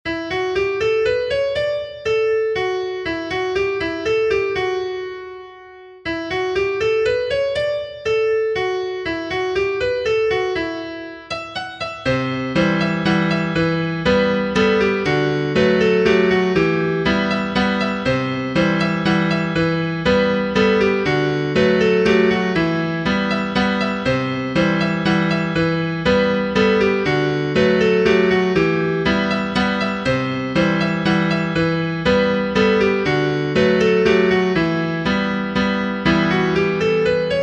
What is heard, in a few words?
Mazurka Limousin